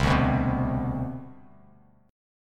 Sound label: C Major 7th Suspended 2nd, C, down, Bass Standard; strummed; CM7sus2